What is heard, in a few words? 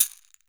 CAXIXI, Brazil Drum Perc; E-MU Producer Series Vol. 7 – Old World Instruments